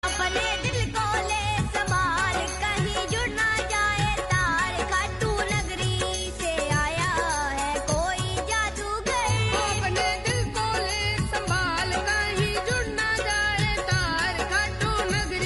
Khatu Shyam Bhajan Hindi

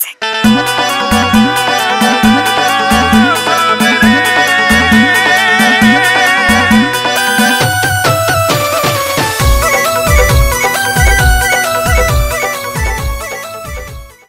Instrumental

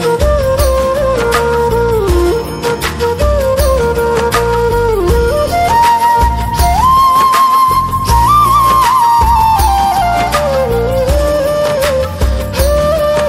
Flute

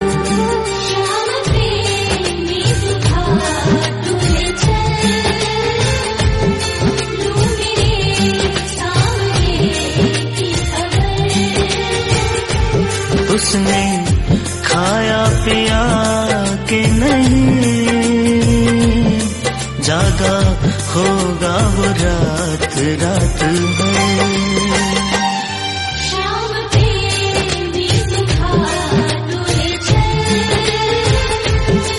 Hindi Bhajan Ringtone

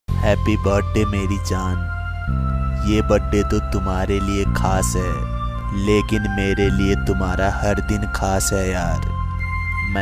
Happy Birthday Meri Jaan; Happy Birthday Status

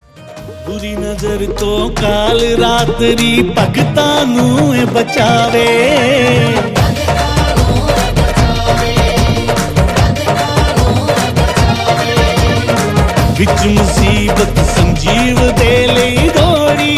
Kali Mata Bhajan

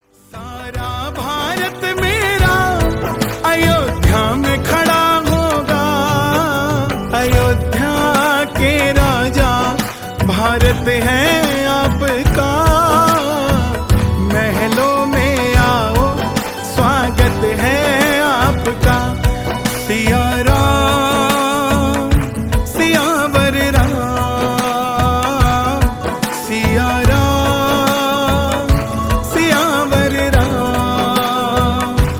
Ram Bhajan

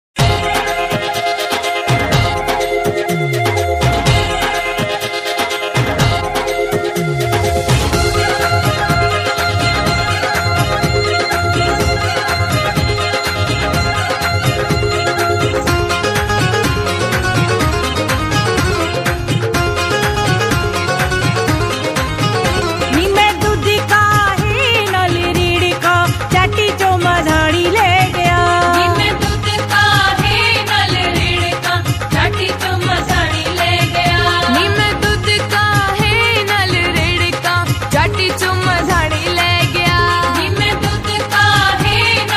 Krishan Bhajan Ringtone

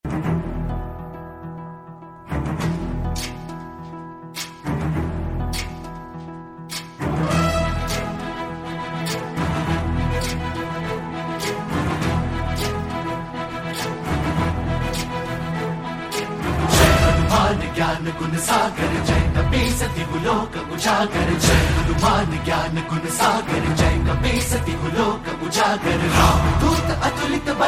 Hanuman Chalisa Ringtone; Jai Hanuman Gyan Gun Sagar

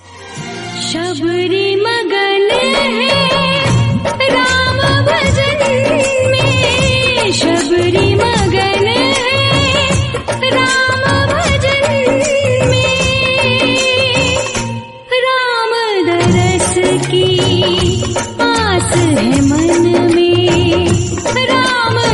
Ram Bhajan

